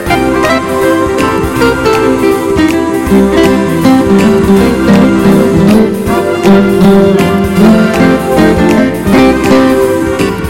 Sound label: A Jazzy Band